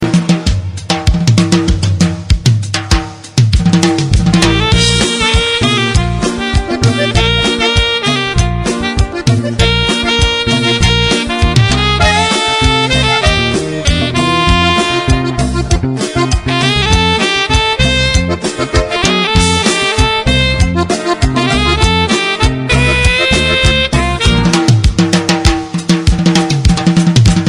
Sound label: drums and bass guitar